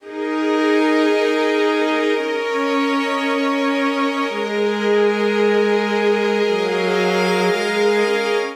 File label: Progression Patience isus4-VImaj7-iv9-IIImaj7 Eb Minor 112 bpm, String Quartet; MIDI Clips; Ableton; Factory Packs; Spitfire Audio